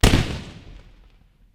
flash, weapons; Left 4 Dead 2

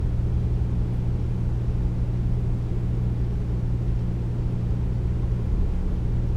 corridor room tone; ambient; Counter-Strike Global Offensive

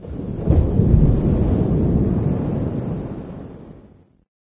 distant wave, water; Garry's Mod; hl2

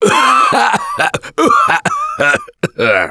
Cough; Gambler; russian

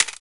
scar20 clip touch, weapons; Counter-Strike Global Offensive; csgo

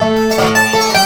dobro danger

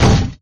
tank fire double

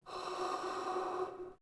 Gasmask Exhale; Sid Meier's Civilization 4 Complete; Beyond the Sword; Units

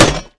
shield hit metal metal; Mount&Blade Warband

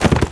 horse gallop; Mount&Blade Warband

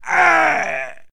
man death; Mount&Blade Warband

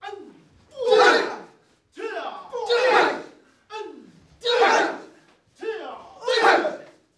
karate; GrandFantasia

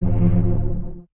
Grave Run; Sid Meier's Civilization 4 Complete; Beyond the Sword; Units

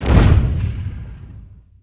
shell dud; Emperor - Battle for Dune

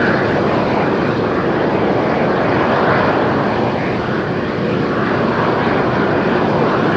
airplane jet idle; Hearts of Iron IV Death or Dishonor

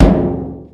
metal barrel impact soft; physics; Garry's Mod; hl2

